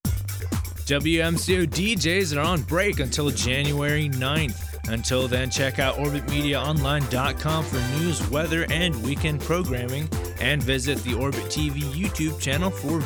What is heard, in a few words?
Winter Break Sweep